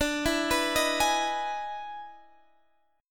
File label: E Major 7th D, E/D Major 7th chord